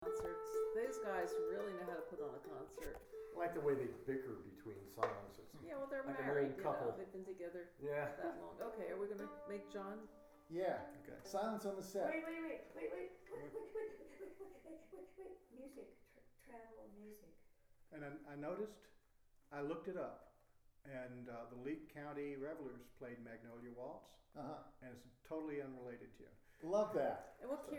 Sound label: Waltz